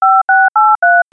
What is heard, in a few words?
dialing sound dtmf